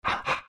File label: pant big, greevil; npc; courier